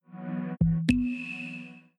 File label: Startup; Peloton